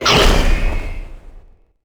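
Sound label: eagle attack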